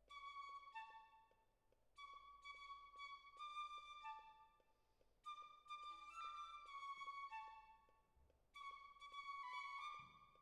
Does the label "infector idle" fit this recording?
No